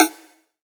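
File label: house snare drum; USB Soundscan vol.11; Drums Dance & Groove; AKAI; HOUSE KIT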